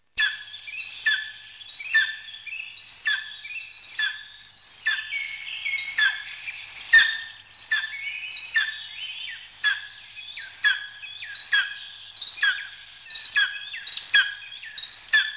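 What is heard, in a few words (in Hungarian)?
Békászó sas; Madarak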